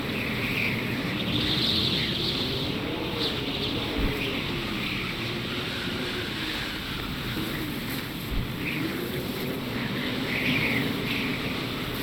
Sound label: ambience; We Were Here Forever; windowling